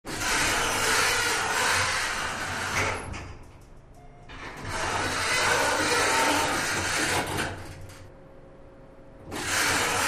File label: Door Elevator Open